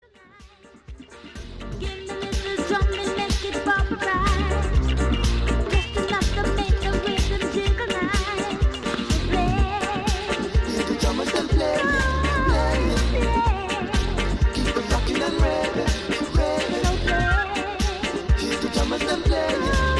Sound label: Drums